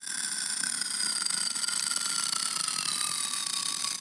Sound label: Long Continuous Rustle